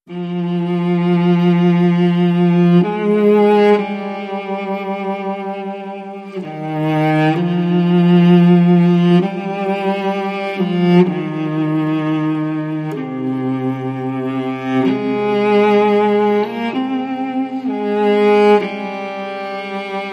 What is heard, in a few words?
Cello